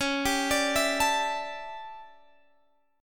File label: D Major 7th Suspended 2nd Suspended 4th